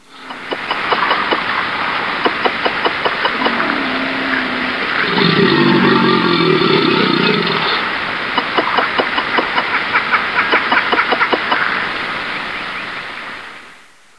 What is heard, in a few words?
gator; tfc